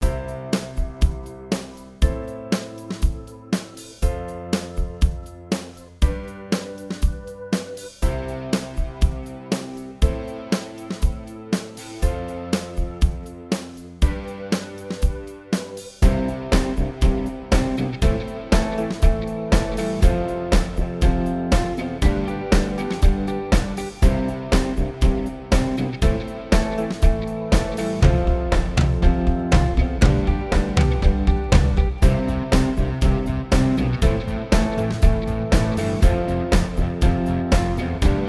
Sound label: I vi IV V Rock C